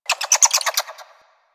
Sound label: Bird Clicky, Ambience; One Offs; Warframe; Eidolon Plains